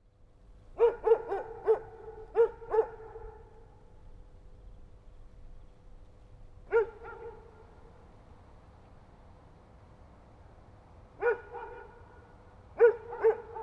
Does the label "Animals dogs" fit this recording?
Yes